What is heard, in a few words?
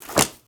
Lock